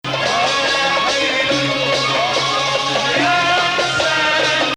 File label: Ajam